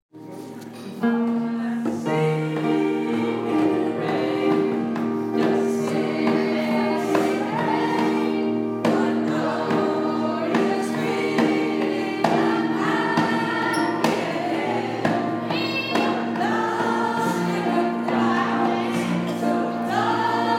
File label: singing in the rain